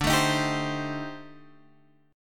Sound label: A-Minor 7th Flat 5th D, chord; Am7b5/D strummed; JGuitar